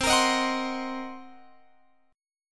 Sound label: C Minor 7th Flat 5th Guitar Open F, chord; Cm7b5; strummed